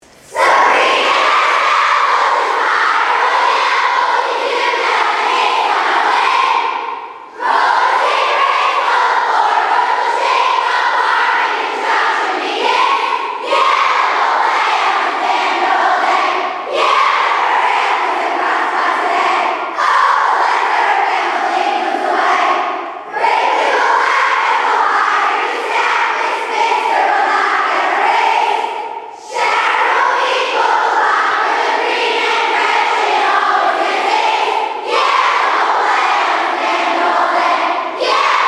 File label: Cheer, Camper Fun campsongs